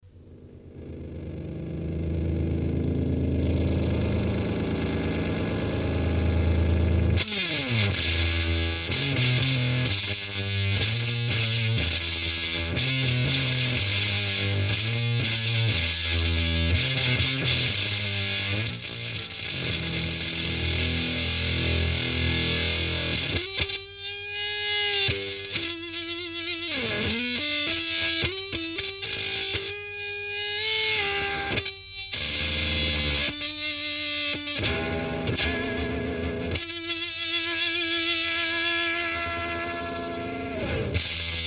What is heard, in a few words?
Oct Up Sick Box, circuit demos